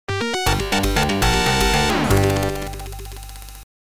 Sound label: game over